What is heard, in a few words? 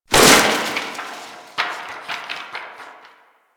Desktop Book Destroy; Warframe; Ambience; Entrati